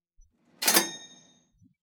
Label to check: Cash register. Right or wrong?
Right